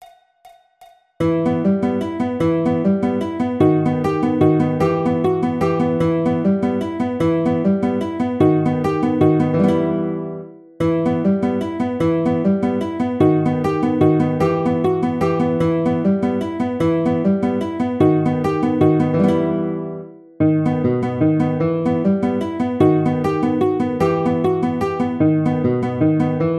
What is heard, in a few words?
Waltz Guitar; classical